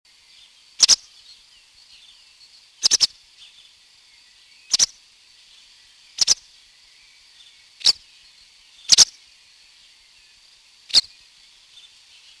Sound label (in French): bergeronette grise, chant